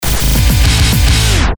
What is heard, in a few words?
Alt Emo Stab, Stabs & Bursts; Best Of N2O Library